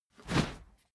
whoosh cloth fast soft; swooshes-swishes

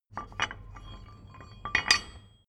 metal drawbar handling

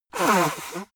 nose blow big; soundpool

